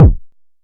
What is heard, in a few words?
analog attacked, Kickbank